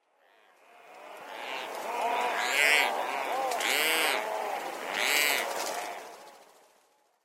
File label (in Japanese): utou, haboro